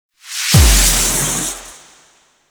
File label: Life Impact; Warframe; Dante